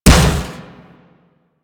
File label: Impact Metal Heavy, Weapons; Warframe; Furax